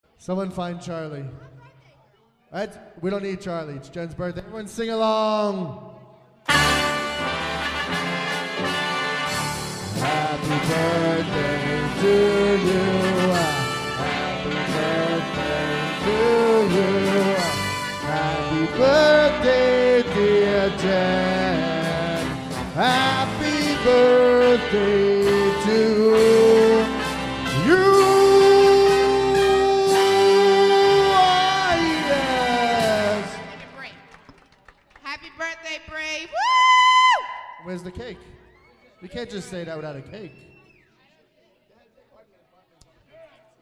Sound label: Everyone Happy Birthday